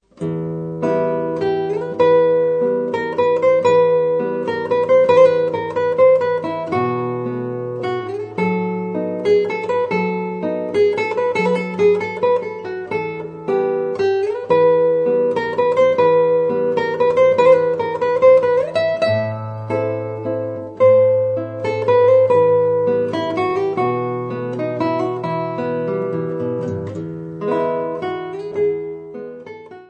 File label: mazurka traditional